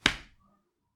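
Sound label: punch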